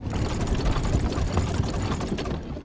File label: turn rudder